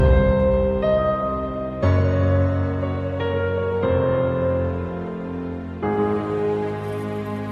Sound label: piano